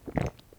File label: swallow